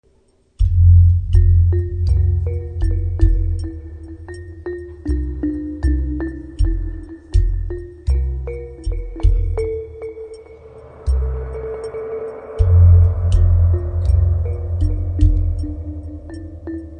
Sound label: gong